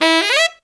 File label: TENOR FX; Zero-G; Phantom Horns